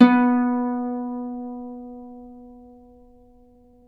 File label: Celtic Harp, Plucked Strings